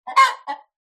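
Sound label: cockerel single cluck; animals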